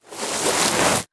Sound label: Object Drag On Dirt; sound-effects-bible